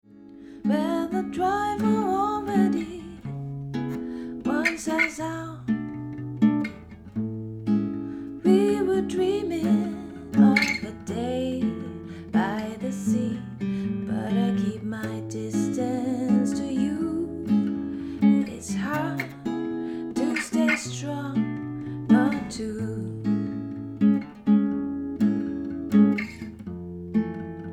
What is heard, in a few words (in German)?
Zoom H6 Essential Gitarre Gesang Kapselmikrofon 24 dB